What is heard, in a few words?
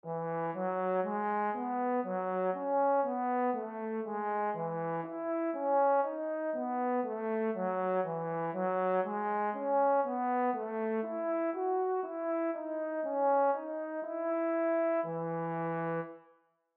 Tenor Clef Example